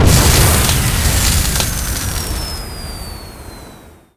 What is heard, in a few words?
you explode; ships pd; Cosmic Rage